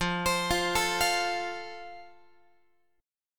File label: F Major, Guitar; arpeggiated